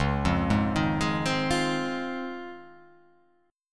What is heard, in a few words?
C sharp Major 9th